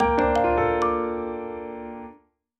Jingle Start; Clubhouse Games: 51 Worldwide Classics

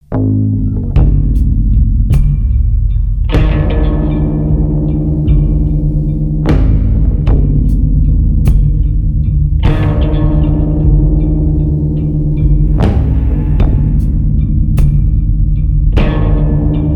Ambience Spider Lair

